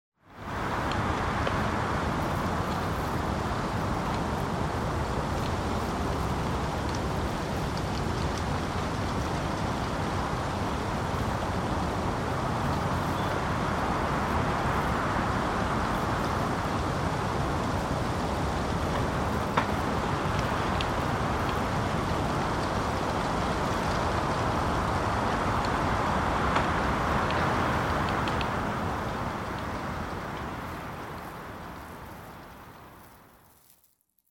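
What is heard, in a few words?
winter, branches cracking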